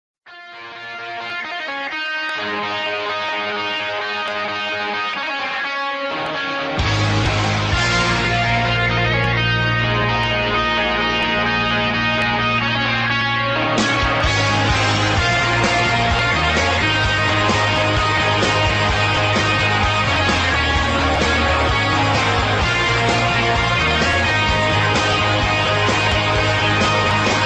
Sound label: rock ringtone; iphone 7